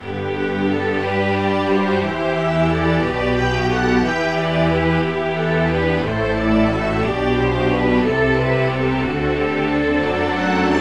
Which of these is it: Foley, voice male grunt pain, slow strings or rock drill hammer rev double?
slow strings